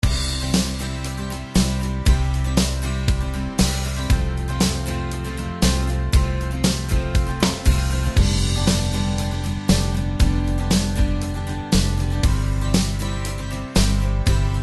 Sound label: Guitar Swing